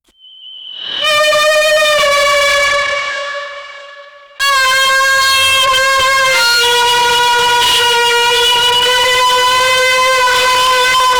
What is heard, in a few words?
super scary and very loud, gibberish